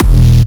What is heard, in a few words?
Jump Kicks, Bassdrums